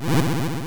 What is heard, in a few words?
arm spin; Dethl; Zelda